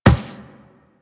Walk Metal, Footsteps; Warframe; Operator

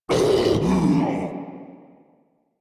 Pain; Grineer Ghoul Devourer

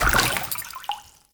Walk Water, Footsteps; Characters; Enemy; Lady Deora

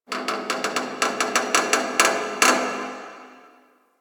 Metal Creak Small; Warframe; Quests; TheNewWar; Ambience; Zariman

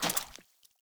Water Step; Characters; Ambient; Steps; Sprout Farmers

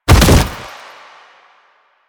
Bodyfall Light Default; Warframe; Lasrian; Lasrian Soldiers; Foley; Body Falls

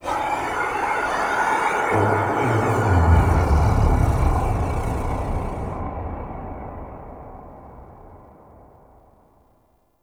Sky Breaths, Ambience; Warframe; Duviri

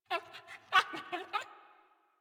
Puppy Idle Speak Playful; Enemies; Kubrow; Warframe

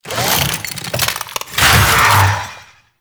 Necramech Melee Get Up; Enemies; Warframe

